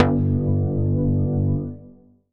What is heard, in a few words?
Synth Shot G